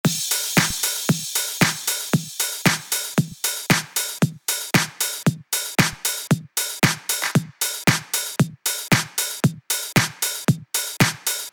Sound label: deep sound effect remix